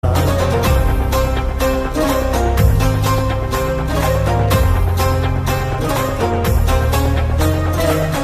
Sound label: Arabic BGM; KGF